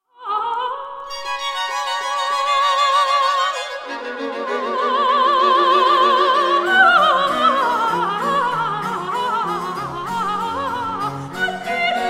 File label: motet for soprano 2 violins and continuo; Coelestis dum spirat aura